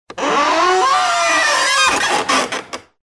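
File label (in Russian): мощный скрип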